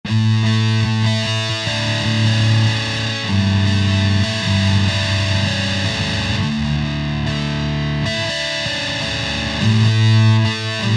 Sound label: Alt Dist Gtr